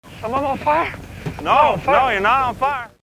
bonfire

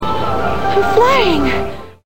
flying

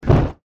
Fire Ignite

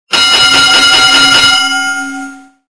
boxing bell multiple

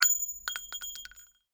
gun shell drop

